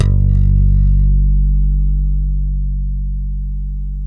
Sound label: FUNK, Bass; Spectrasonics; Bass Legends